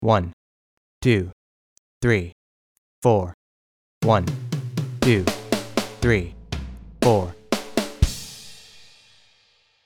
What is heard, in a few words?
Basic Fill